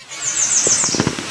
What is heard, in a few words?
Bird song